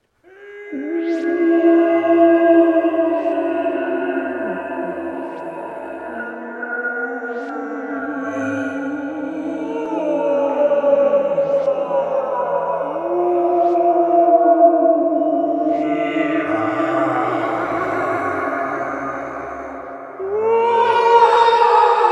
purgatory dark moaning and pain; Страшные звуки для монтажа

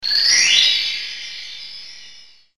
enter course; Звуки из игры Марио

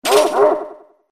chain chomp; Звуки из игры Марио

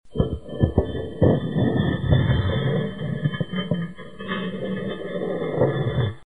door move

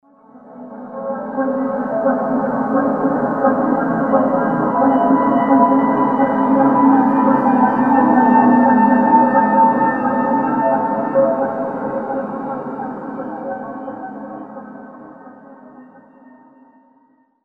arpedgy; Страшные звуки для монтажа

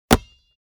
basketball punch on the ball

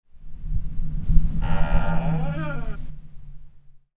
door creak opened by the wind; Страшные звуки для монтажа

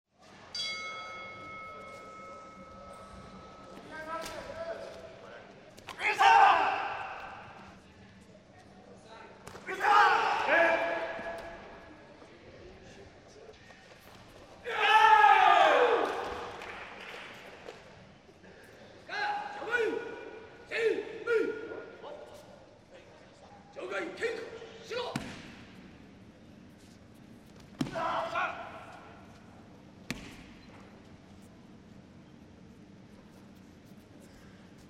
ambience karate tournament with spectators

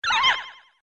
enemy down; Звуки из игры Марио

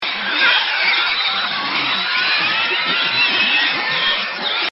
pouic; Страшные звуки для монтажа

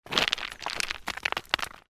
stones falling down the mountain

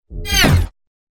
quick zoom impact

